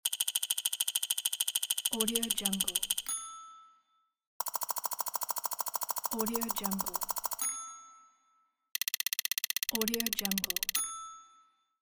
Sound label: counter